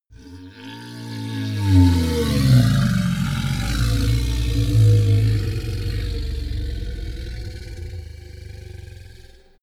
minotaur grunt long; kreaturen

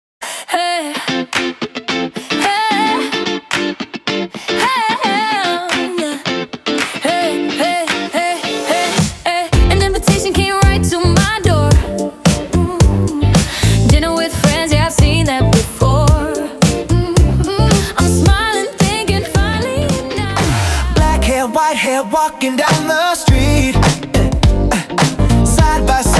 pop radio

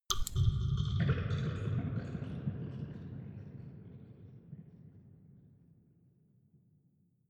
dripping

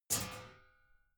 metal sheet crash light; metall

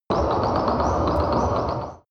extrinsic drone, energie

